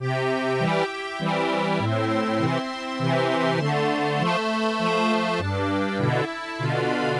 Love accordian; Petz 5

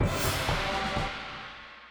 Level Unlock; Cosmic Defenders

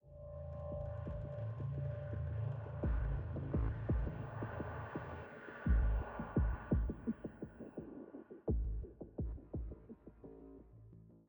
Military High 85bpm

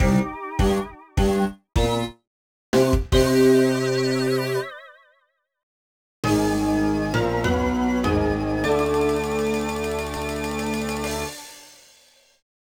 Boss Clear; mario vs. donkey kong mini land mayhem; Warped Mansion

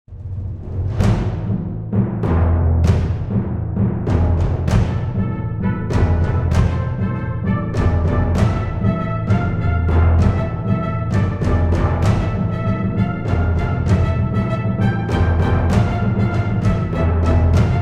Tension; Racing the Wind 02 - Tension A

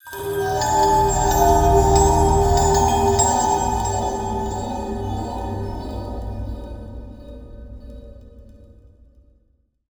Stinger; Bunker

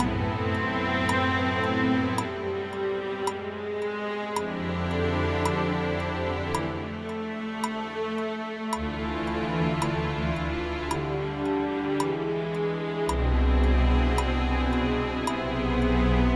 sad loop; the detail

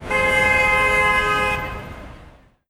Car Honk

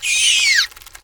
insect die; Insects

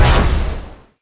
Bomb; Idle Wizard

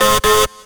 Horn; Nicktoons Nitro; arcade

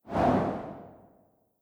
cloud transition open; Animation Throwdown

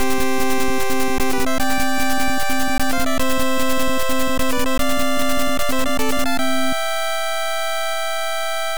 All Stage Clear; Megaman 2

